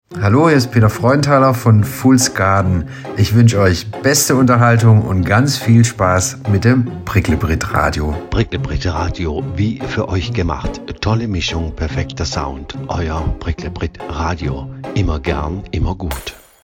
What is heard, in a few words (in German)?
Clip mit Musik